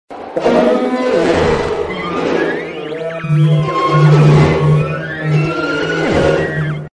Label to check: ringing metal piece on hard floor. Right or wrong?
Wrong